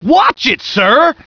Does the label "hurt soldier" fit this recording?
Yes